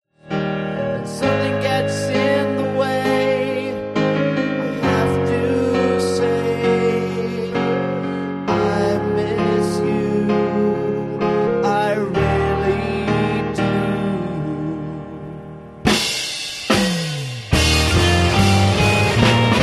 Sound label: bells strings